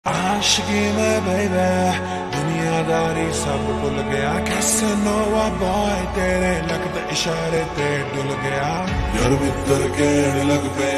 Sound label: slow hindi ringtone